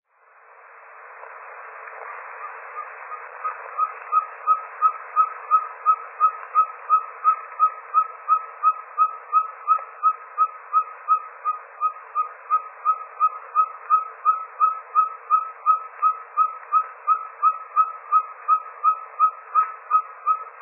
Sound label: ferruginous pygmy owl, Belize birds birdsongs